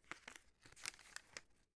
paper handling

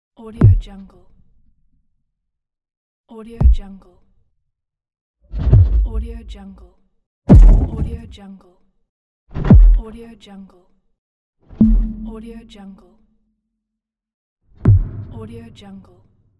thuds; volkovsound

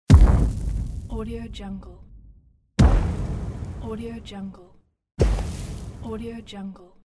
fire impact; tibasfx

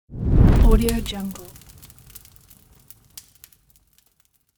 fire whoosh effect

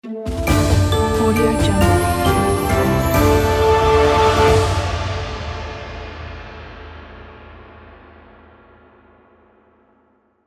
gala logo

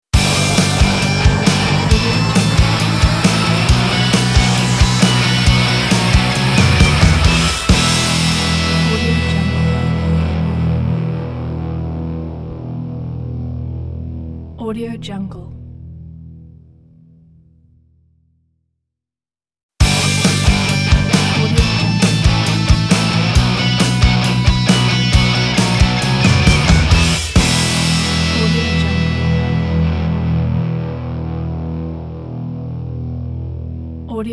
car auto power rock logo

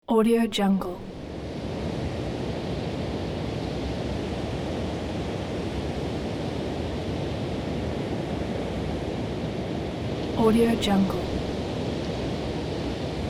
wind in mountains

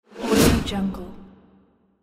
whoosh fast; archisound

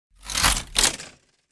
urban debris plastic little object passby

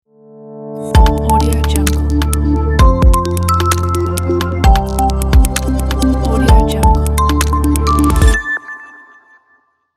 high technology logo

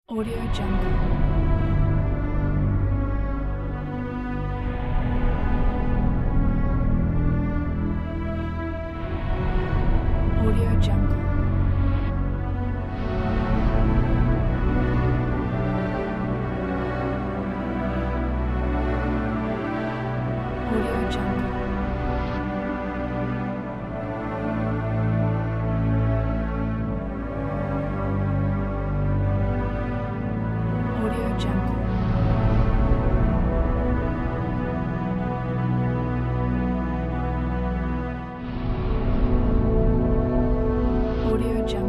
dark funeral

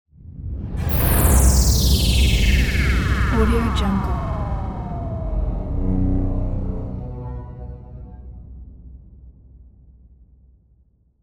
retro teleport

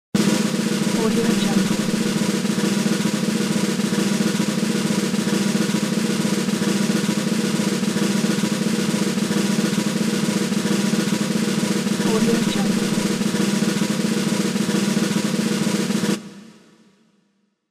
drumroll

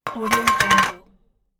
small metal cap dropped

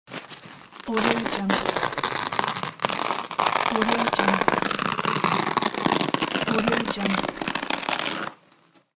paper tear

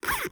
zipper fast

